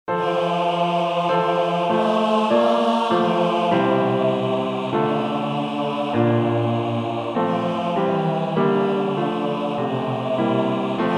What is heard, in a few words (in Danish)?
Seniorkor Bas; Herlev Seniorkor; EN ROSE SA JEG SKYDE